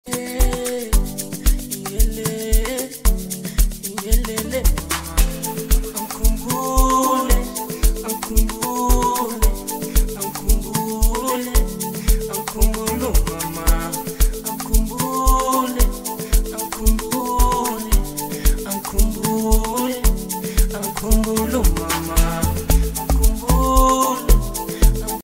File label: Amapiano Mix